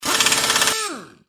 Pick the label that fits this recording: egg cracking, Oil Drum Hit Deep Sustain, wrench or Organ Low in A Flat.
wrench